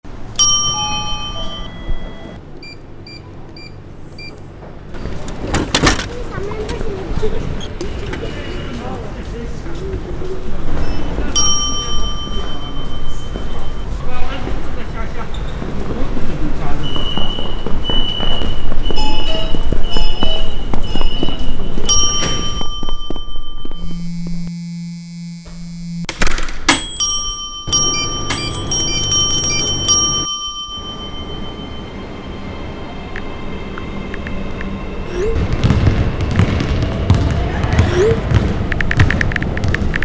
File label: Soundscape